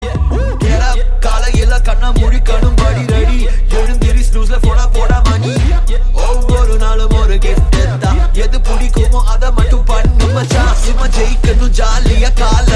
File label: Rap Version Ringtone